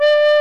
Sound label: MANDOLIN